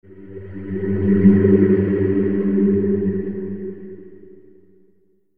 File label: spooky swell